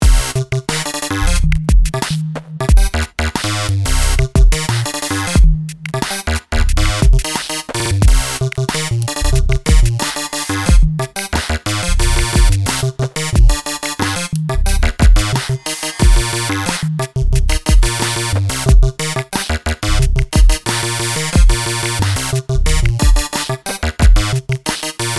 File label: reset step length drums, sequencer; Numerology; Five12